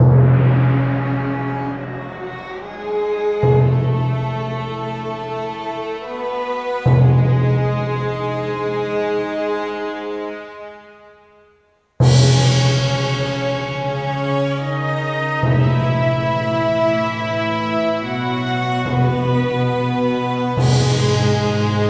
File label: Stables